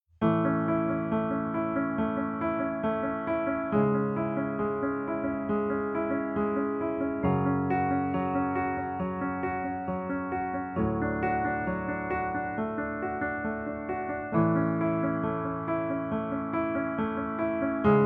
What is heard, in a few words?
piano riff